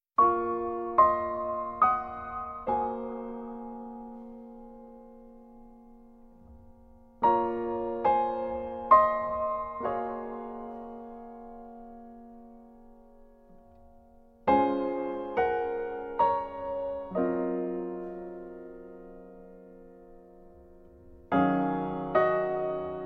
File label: Piano Solo